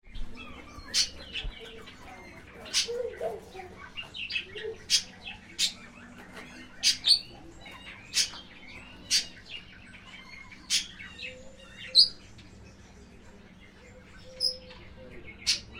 spice finches and western grey plantain eater at bird gardens; lotherton hall